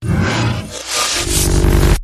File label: Glitch Unsteady